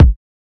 puffy steel kick drum, B key, bass drum one shot tuned to the B note